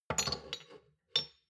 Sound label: shot glasses stack slide soft; soundpool